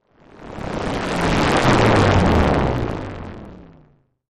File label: Wash Liquid Ripple